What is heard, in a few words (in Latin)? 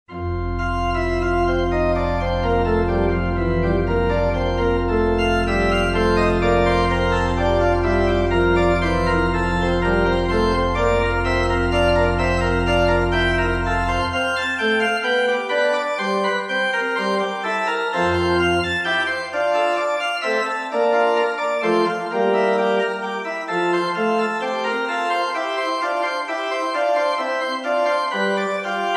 praeludium in f, orgel; jl krebs